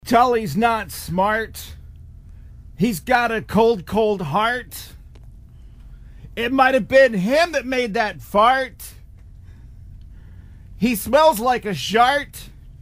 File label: rap